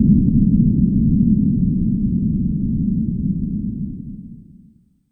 Sci fi

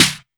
SN, 2 Step; USB Soundscan vol.51; House Side Of 2 Step; AKAI

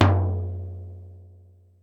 Timbale Low, Ensoniq ASR-X Pro

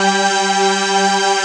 ORG G, ELIXIA ORGAN; Best Service ProSamples vol.10; House; AKAI

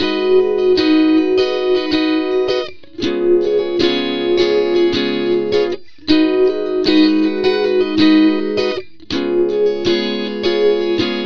Rytm Gtr G#, Slo Mo Funk, Lounge; Zero-G Kocktail Kollection; Construction Kitz (Lounge)

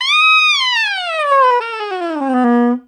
LONG BEND DN, SOPRNO SX

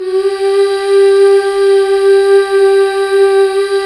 MMHH G, Back.Mmh Fem

